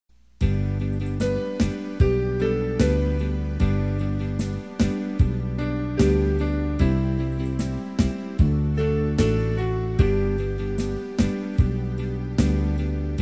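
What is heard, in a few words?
soprano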